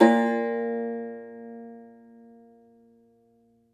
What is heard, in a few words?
Cumbus SAZ A#, Plucked Strings; E-MU Producer Series Vol. 7 – Old World Instruments